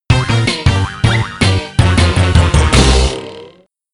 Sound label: Fanfare- Unlucky!; lego racers 2